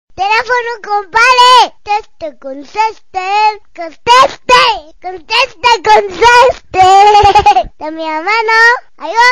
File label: spanish baby ringtone